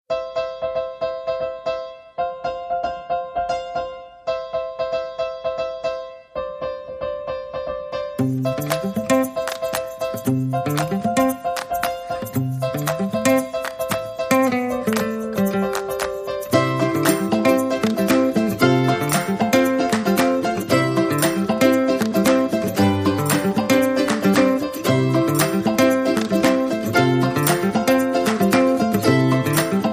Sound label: No Drums No Bass